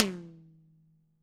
DW HIT, Drums; ILIO; Double Platinum Drums 1; DW+DW

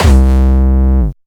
Distortion Kicks, Bassdrums

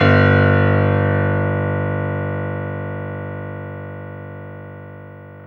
PIA MICRO, MICRO-PIANO; Sound & Vision - Gigapack II

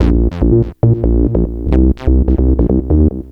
Bass, Futurist Drum & Bass

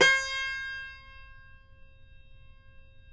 CUMBUS C, Guitars

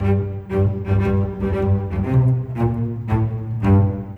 Bass Cello; Sonic Foundry (Sony Creative Software); Orchestral Series VOL-4 - Rock and Pop

